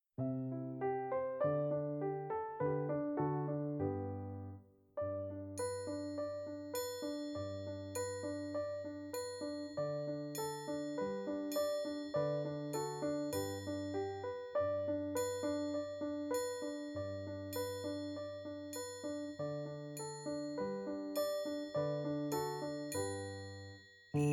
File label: Deck the hall accompaniment